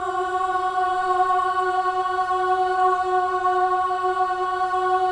BOYS AHS, Symphony of Voices

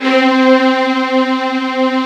ANA STRG, 01W BAROQ; East West; Global Sounds

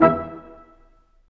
BRS Quintet stac; Roland L-CD702; Quint.Stacc

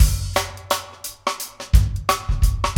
Funky Drums; AMG; Now CD-ROM (Roland); Neil Conti

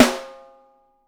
wood; Sampleheads; Peter Erskine Living Drums

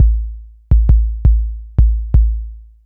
Anabeat Kick

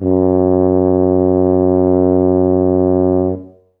tub F#1, Tuba; Best Service ProSamples vol.51 - Classic Orchestra 2

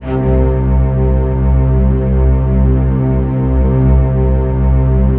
DOUBLEBASS; ILIO; Kirk Hunter Virtuoso Strings VOL-2; 90 s Sample CDs; 5DOUBLEBASS

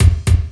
drum, EMU Proteus; Proteus1

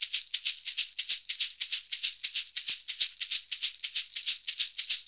SHK, Shaker; Univers Sons; Basicussions

